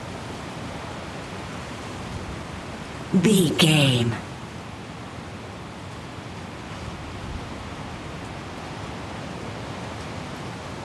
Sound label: wind general gusty high loop